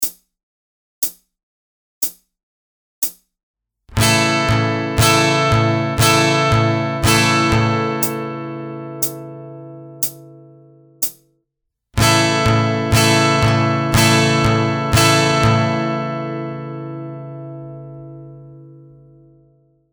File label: Piano Strumming Pattern capo 2 SLOW; Ultimate guide to strumming book